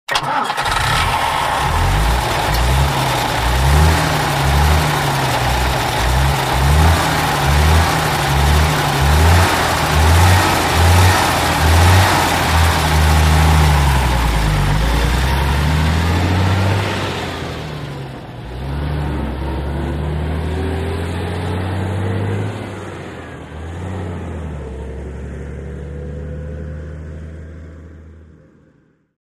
Bus Start Away Coach S

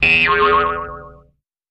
Boing Box Bing; boings

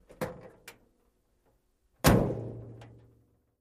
Fire Truck Door

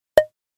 Button Pop Comedy; buttons & beeps

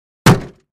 Plastic Car Door Hit

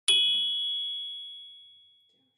Clavichord Separate; keyboards

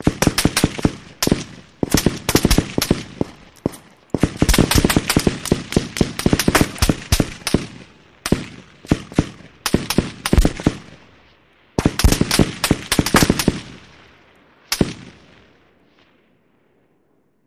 Artillery War Seque

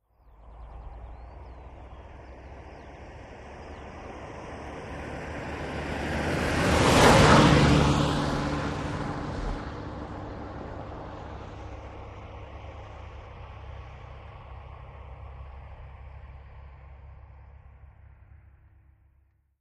VEHICLES ASTON MONTEGO: EXT: Slow pass.

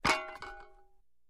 Axe Falls Ring Out Axe

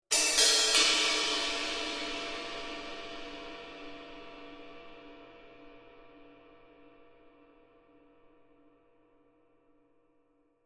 Cymbal Accent, drums cymbal

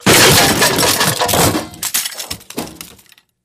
Metal Impact Scrape, ransack room; Metal Impact, Scrapes and Debris

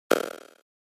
Pop Up Rattle Pop; pop up element

